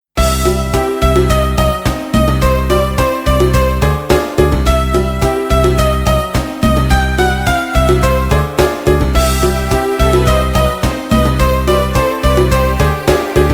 noisy people

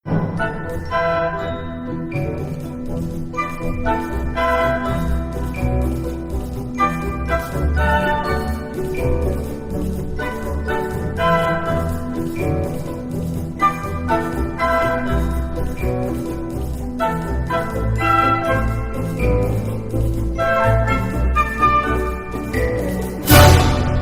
questioning moderato

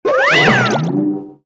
mono appears

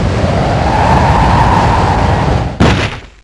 breakdown; zinc

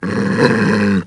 growl; anubis

